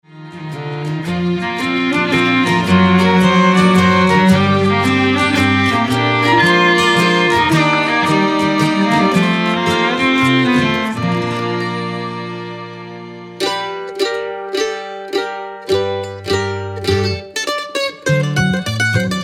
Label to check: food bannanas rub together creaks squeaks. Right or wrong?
Wrong